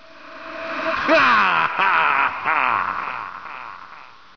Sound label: laugh